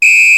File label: WHISTLE, PERCUSSION; Crypton - DrumBase5000